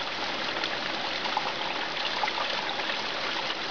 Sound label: Fountain; CAESAR3